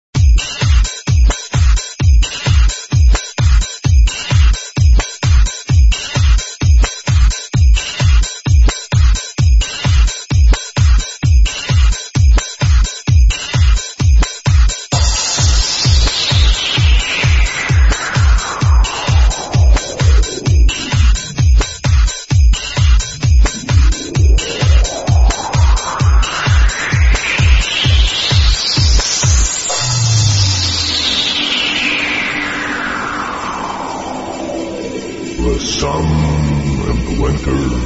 Jingle Bells Remix

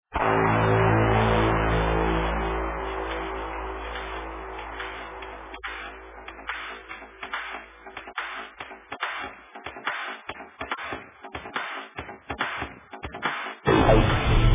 drums at dawns; Progressive Trance; Label: YSE

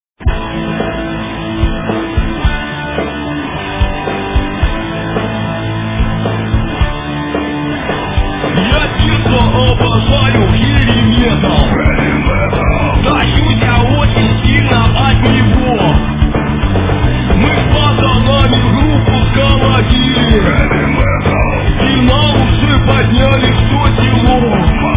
Hyevi metall

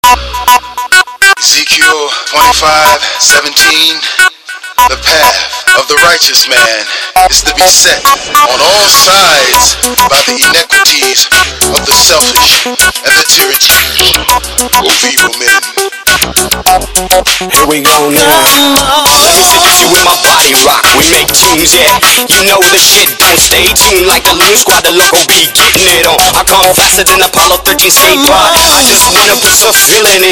rap

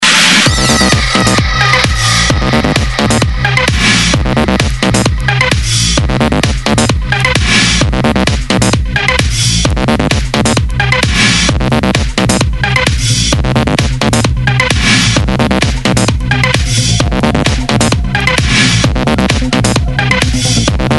Garage

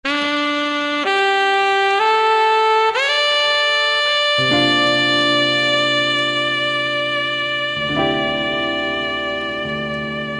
Jazz

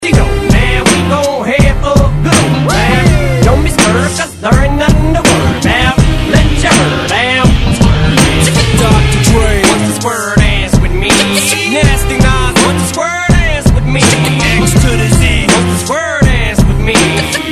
Square Dance

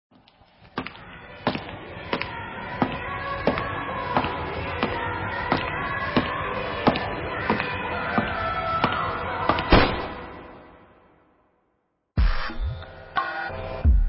Rain Remix

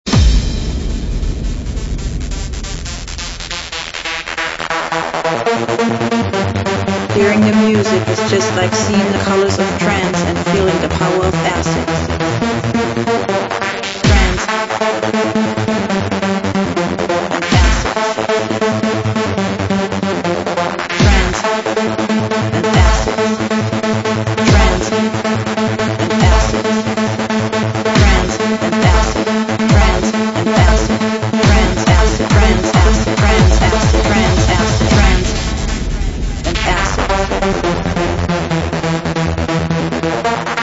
Trance Acid

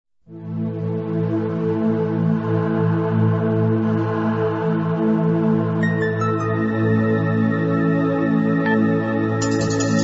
Instrumental

